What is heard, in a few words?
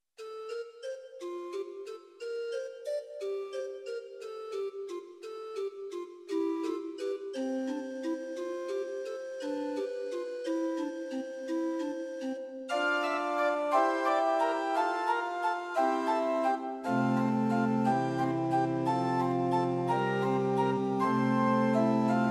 Short Organ Music Pitch 440 Temperament Werckmeister